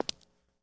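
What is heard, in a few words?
Foot Concrete Shoes Walk; Lucius III; pc linux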